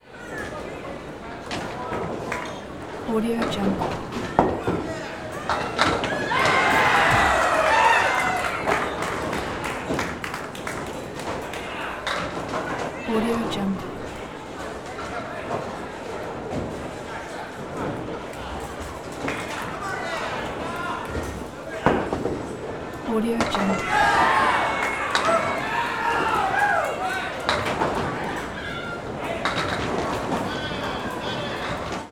bowling ball throw roll down alley hits pins

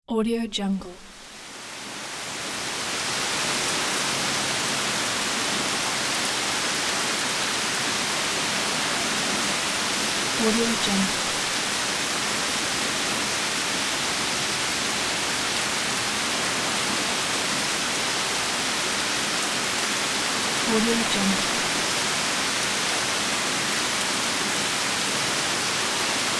heavy rain

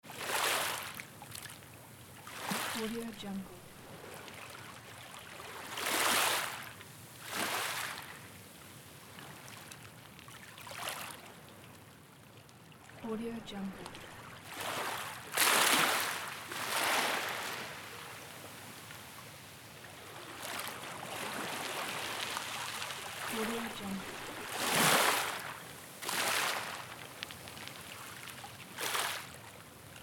scottish stony beach shoreline

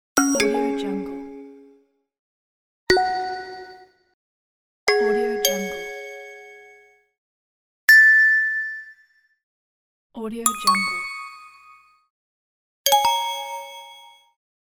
notification pack

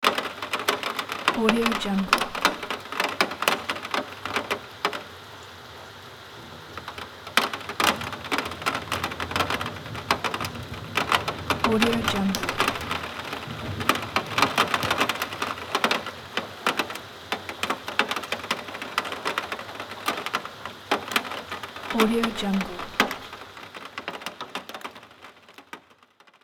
rain falls on window

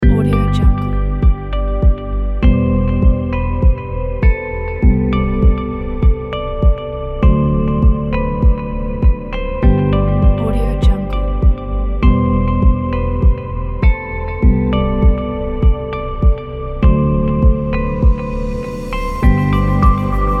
ambient music

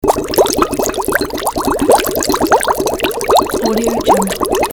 water bubbling loop

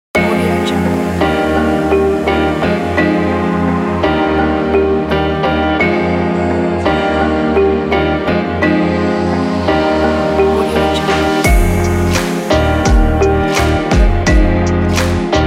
chilled future bass

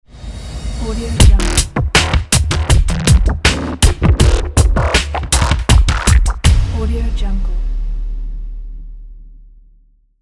epic industrial logo intro

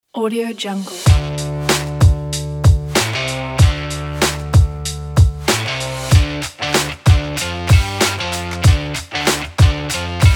sport hiphop

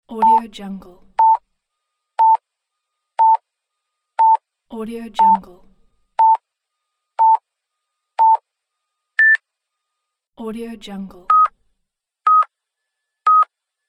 countdown beep tones pack

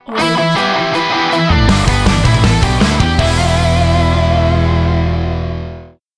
rock logo; andreylebedintsev

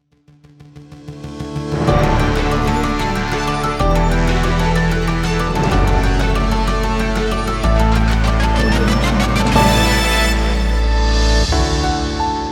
news logo

